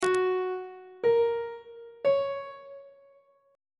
d sharp aeolian chord 3 is f sharp major on treble clef